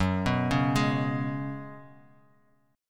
Gb Suspended 2nd Suspended 4th, Bass Standard, Gbsus2sus4 chord; arpeggiated; 14,14,11,13